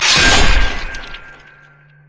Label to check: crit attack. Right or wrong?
Right